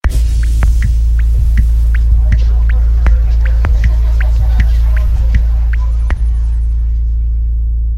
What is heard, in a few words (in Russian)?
ustrashayuschaya sms prikolnaya melodiya sms na telefona